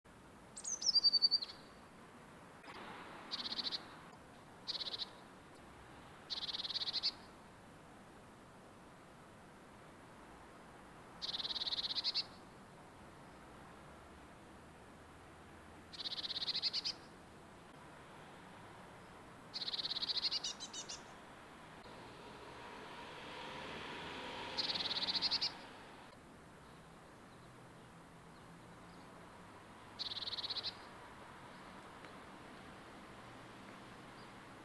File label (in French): mesange bleue